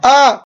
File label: MAN SCREAMING